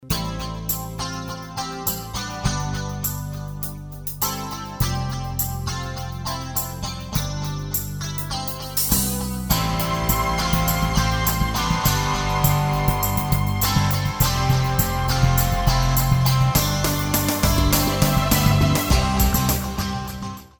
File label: musicbed sample, contemporary